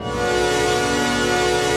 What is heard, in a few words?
chord pad; Best Service; XXL 800 Pads; Sample CDs